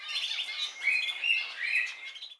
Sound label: bird